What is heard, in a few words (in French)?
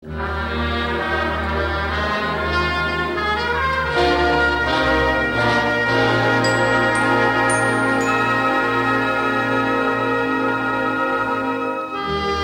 Instrumental